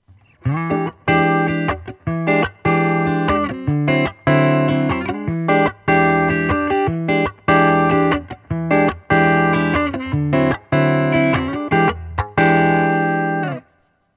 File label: black panel; Cube 40XL